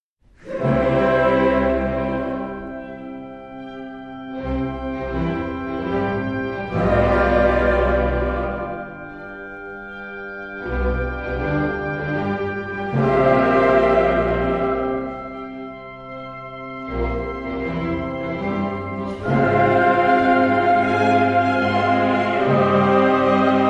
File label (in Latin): Missa in C Kyrie